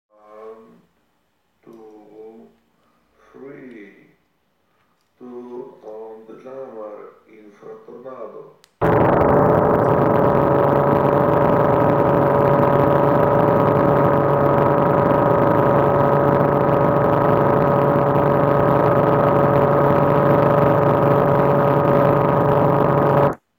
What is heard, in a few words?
Audio jammer; INFRATORNADO; Samsung Galaxy S6